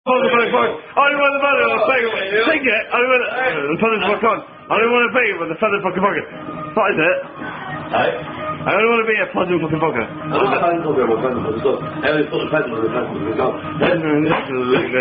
Pheasant Plucker Song; Tribal Dance

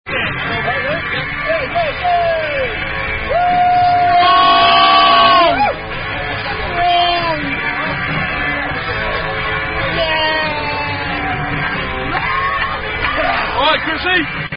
Beer Bong; Tour Willies

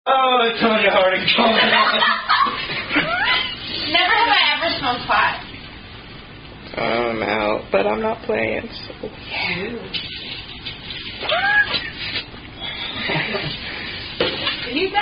drinking game; Never Have I Ever

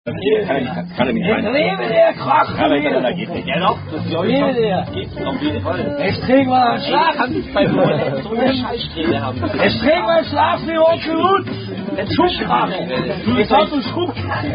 German drinking game; Krockrodiiil Schnapp Schnapp; Crocodile German Drinking Game

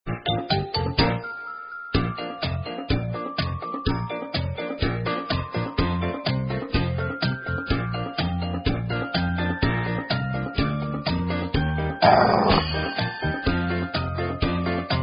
Karaoke Songs; Girl Scouts

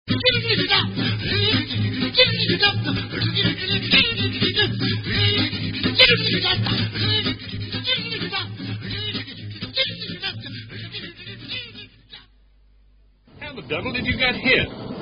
Car Without An Engine

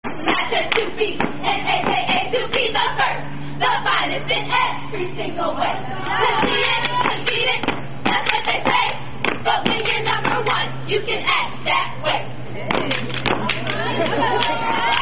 Alpha Kappa Alpha Sorority Chant; Alpha Kappa Alpha Skee Week Uf; This Is A Serious Matter Aka Sorority Chant And Some Step

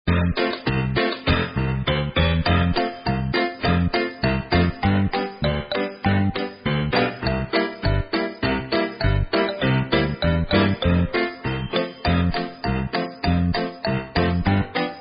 Karaoke Songs; Girl Scouts

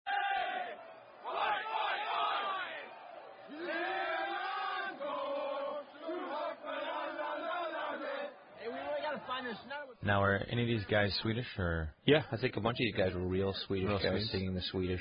Helan Gar, Swedish Drinking Song; Swedish Drinking Song (From Beerfest)

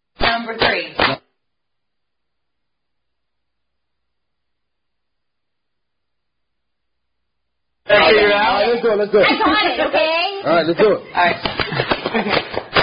The Clap Game; Related Thumper Drinking Game (Non-Drining Game)

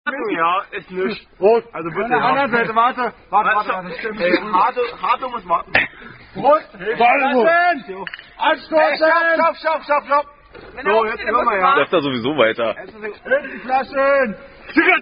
Recited By Germans Drinking; Gsg Zicke Zacke; Variant Ziggy Zaggy Oy Oy Oy

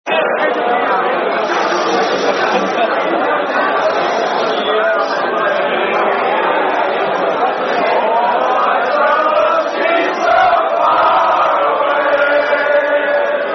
Sing-Along; Bingham Cup 2008

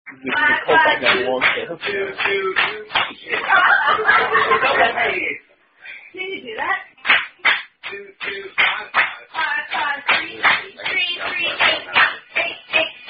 Zoomy Zoomy, Drinking Game

